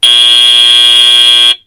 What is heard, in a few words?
match end; ftc